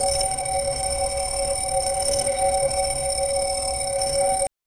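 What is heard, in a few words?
rhythmic celestial scanning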